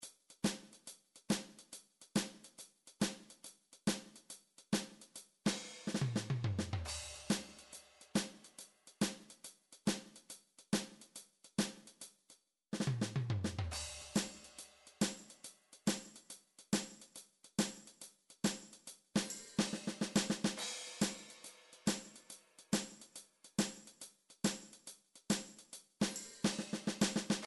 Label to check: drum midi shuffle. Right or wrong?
Right